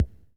kick

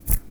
hand mono

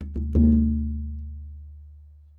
lower hit, tabla; Dirt-Samples

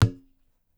tabla; bahia; Dirt-Samples

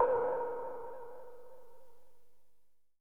hit; Super-Dirt project

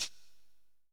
hit

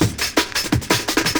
vintage

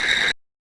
silent

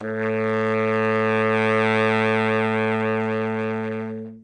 notes a, sax; Dirt-Samples

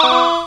zap; monsterb

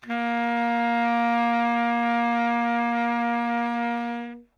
notes b flat, sax; Dirt-Samples

